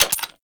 Rifle Alt Mech; Warframe; Weapons; Tenno; Sundial

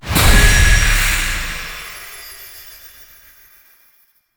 Horse Racing Portal Touch; Warframe; Duviri

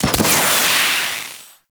Flying Spaceman Jetpack Burst; Warframe; Venus

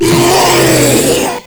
Proto Infested Ancient Vocal Attack; Warframe

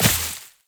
Step Veg, Footsteps, Walk; Warframe; Characters; Tenno

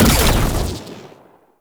Shotgun Shot Fire; Pax Duviricus

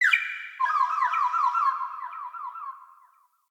Forest Open Glade Bird Med Two; Day

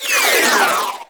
Sentient Marrowtick Pod Incoming; Warframe; Tau

